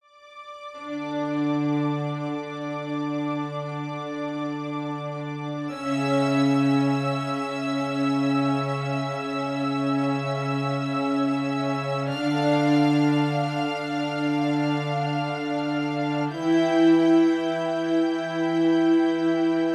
Big rise drone dark horror dramatic

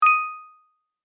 Bright success ding notification positive

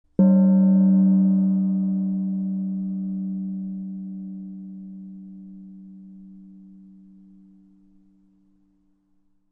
Chinese gong hit

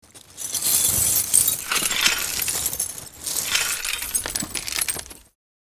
Small glass debris shards movement

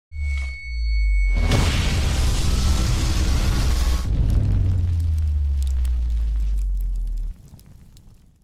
Sci-fi weapon metal melter long burst

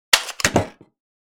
Bolt rifle empty mag drop; assault rifle

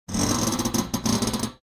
Metallic spring creaking fast

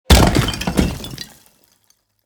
Big building block thrown on asphalt

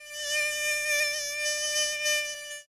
Annoying mosquito buzzing; Insect

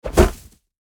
Snowball impact hitting wood

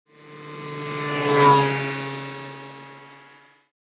Scooter driving passing by

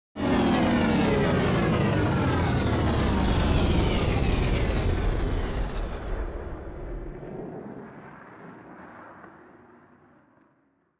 Cargo spaceship landing

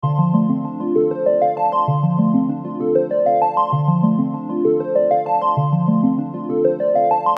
Infographic call ringing tone loop

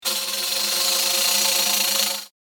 Tambourine rolls; drums and percussion

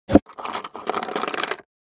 Board game box open; Entertainment and Leisure

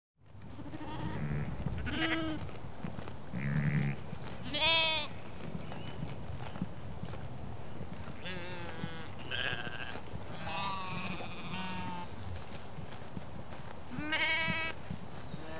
Sheep on pasture walking on grass and bleating

